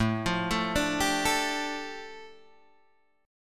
A 7th Suspended 4th Sharp 5th, A, down, Guitar, Open G